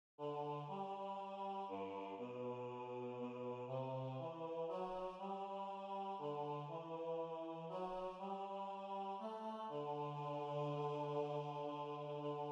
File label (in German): bariton